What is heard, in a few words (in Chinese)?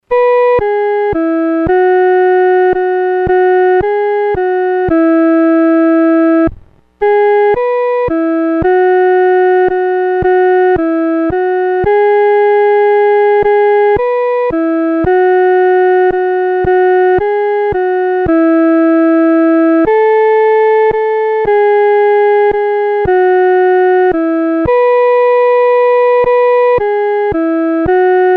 独奏 第一声; 奇妙圣经